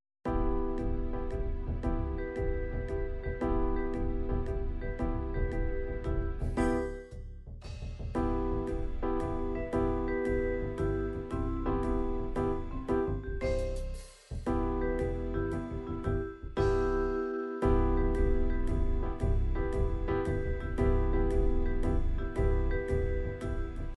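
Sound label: psr9000 jazz waltz, midi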